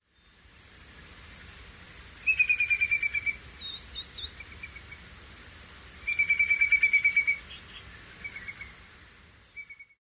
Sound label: green sandpiper nocmig